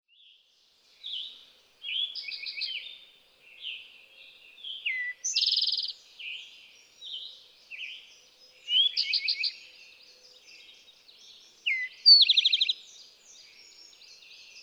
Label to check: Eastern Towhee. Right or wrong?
Right